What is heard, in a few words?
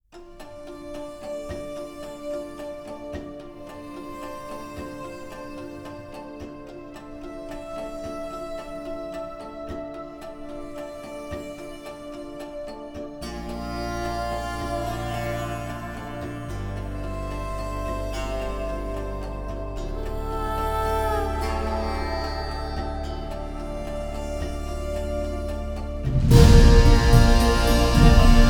Reeds Harmonium Tanpura; World; Evolution Series; Mirror